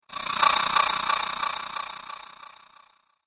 Magical Impact Accent

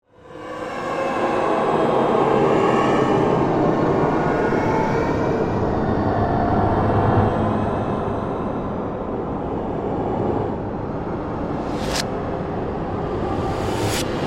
Horror Composition, Ghostly, Swells, Haunting

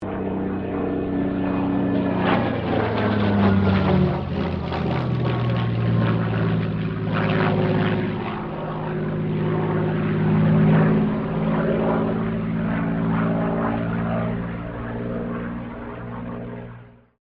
AIRCRAFT SUPERMARINE SPITFIRE MK-XVI PASS

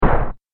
Dinosaur Mighty Footstep

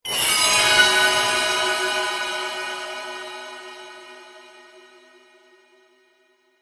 Eerie Chimes Scary Suspense Dark Metallic; Scary Tone Sound Effects; Horror; BBFX

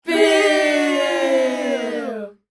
Kids Boo; Human; Talking (Sound Effects:Voice)

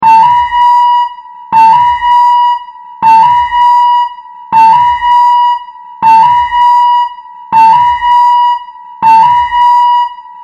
Alarm, Alien, Klaxon, Scifi; 5.1

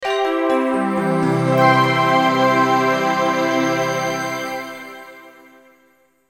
Logo Bells Sweeper; Sonic Logo Sound Effects; Production Element Imaging Element Accent Transition

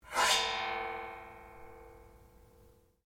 cymbal 6 inch bent scrape; cheap brass cymbal; Cymbals; Musical

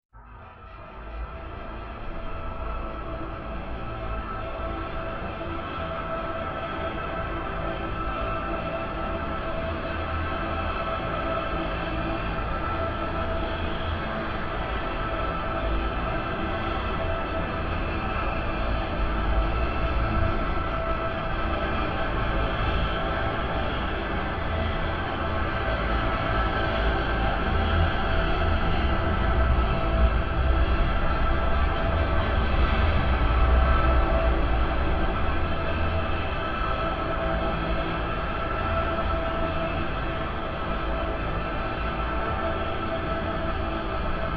Drone Musical Shimmering Builds Intensity; Drone Shimmering Musical Tonal Texture Building Intensity Tension; Sound Design

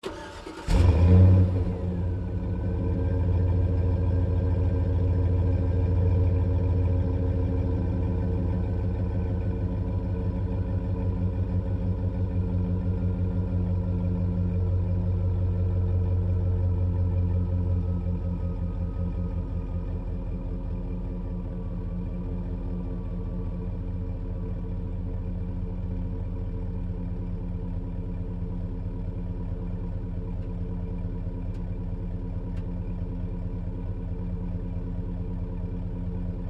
Truck GMC Savana Tailpipe Start Idle Off; 2010 GMC Savana; Trucks; Vehicles; Big Room Sound

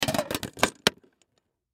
Tin Box Close Lid; Lids (Sound Effects:Objects); Blastwave; Foley

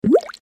Drop Single Drip, Water Drop Single Drip; Dripping; Blastwave

